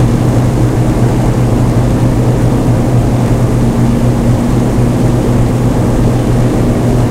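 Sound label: avro lancaster cockpit right, engines; War Thunder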